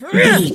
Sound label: voice female attack